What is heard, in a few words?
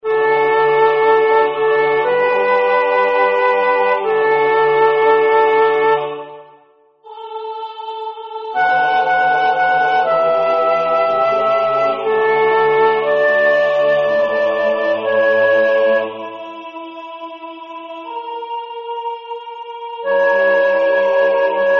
Agnus Dei, Soprano; Zelenka; MissaPaschalis